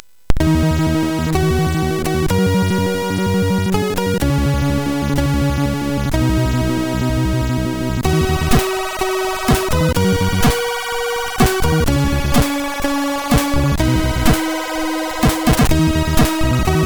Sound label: Blob, MOS6581R4; hvsc